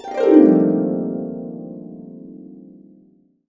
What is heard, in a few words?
Bb maj, Harps; USB Soundscan vol.62; Complete Harps; AKAI